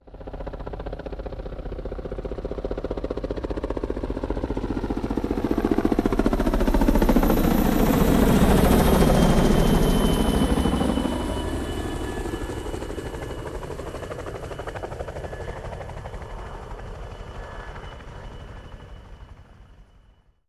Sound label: CH-53 Super Stallion helicopter hovers overhead. Choppers, Aircraft Engine, Helicopter